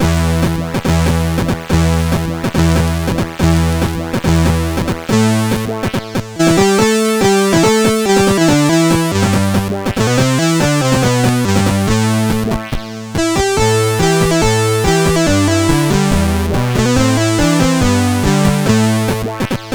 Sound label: Swedish Song Remix, sid, CSG8580R5